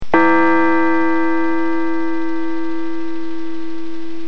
当, 钟声; 广播室铃声系统

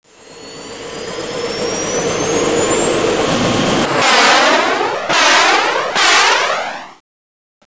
飞机; 交通

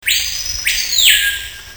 鸟

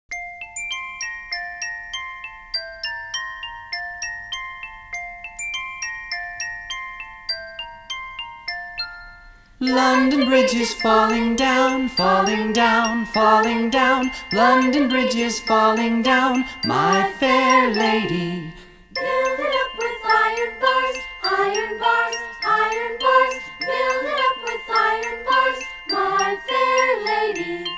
英文儿歌 london bridge

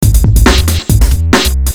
节奏感

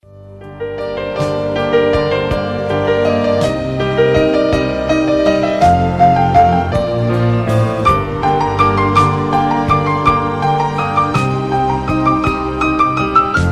铃声 叮咚; 铃声多多

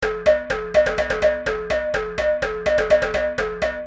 键盘

